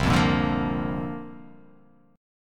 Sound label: C 7th Suspended 2nd Suspended 4th C down